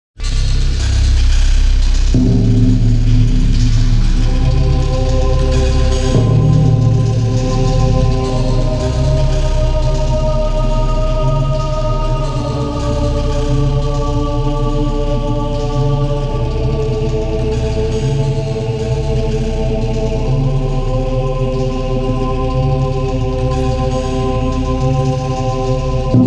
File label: Creaking Limbs; Kagero: Deception 2